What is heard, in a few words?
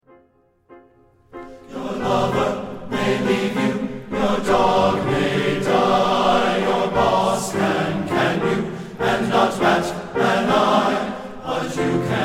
Bittersweet Tango